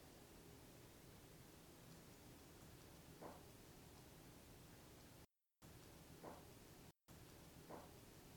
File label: Knock